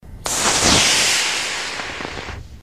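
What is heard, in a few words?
firework single ringing rocket launch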